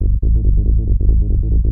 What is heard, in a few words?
TECHNO BASS 140 A